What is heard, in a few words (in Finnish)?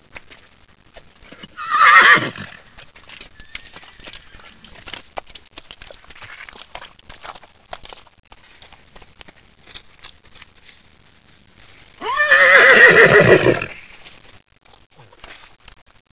hevonen